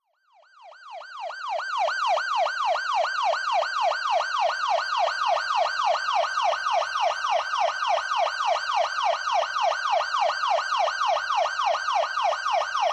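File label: Ambulance Whooper Siren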